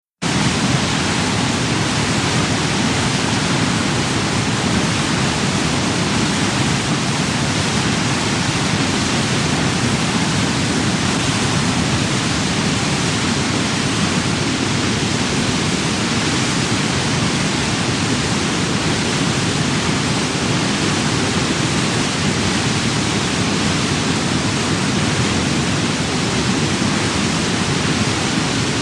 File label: Waterfall Lower Fall